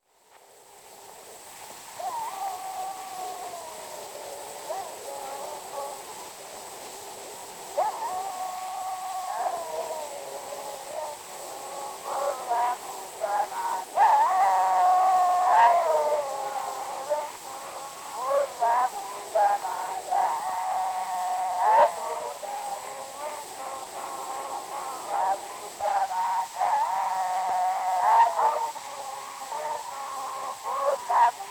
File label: Zulu recordings; Father Franz Mayr